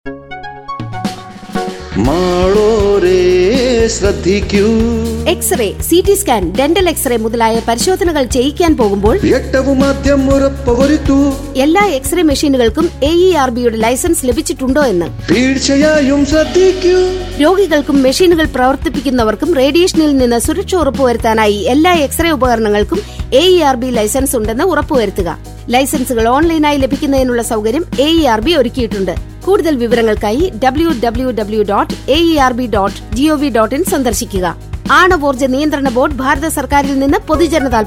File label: jingle malayalam